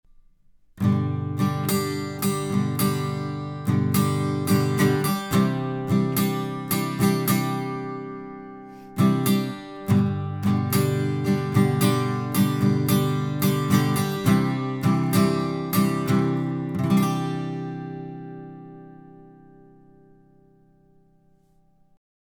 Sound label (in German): Telefunken TF39 Gitarre